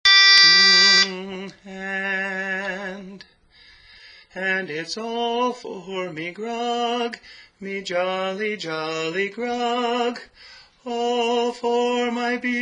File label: All For Me Grog (Tenor)